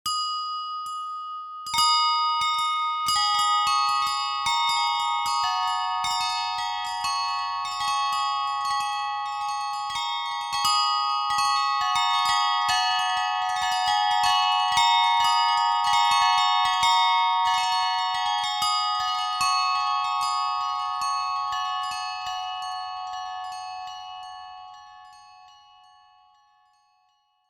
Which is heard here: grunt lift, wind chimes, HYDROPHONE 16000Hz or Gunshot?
wind chimes